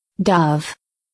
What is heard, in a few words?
dove, wild birds